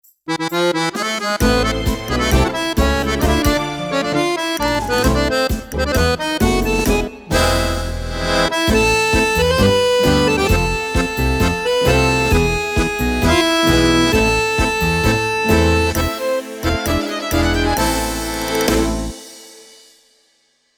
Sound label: tango